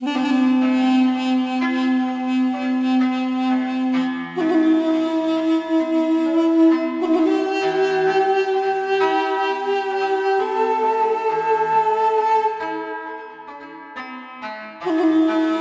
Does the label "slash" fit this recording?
No